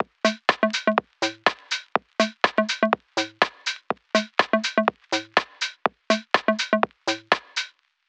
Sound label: Drums